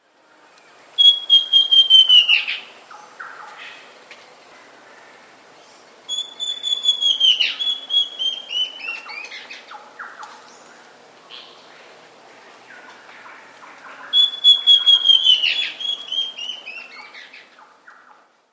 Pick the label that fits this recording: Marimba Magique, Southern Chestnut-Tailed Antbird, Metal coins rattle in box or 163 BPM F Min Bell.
Southern Chestnut-Tailed Antbird